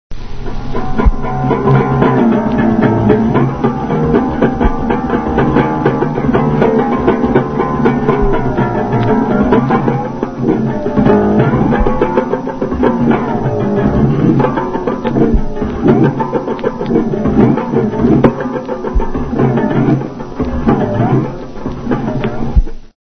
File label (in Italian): gorgogliatore; russolo; futurismo